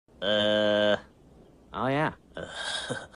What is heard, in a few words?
uhhhh; Uhhhh, oh yeah huh, huh